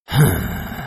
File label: hmmm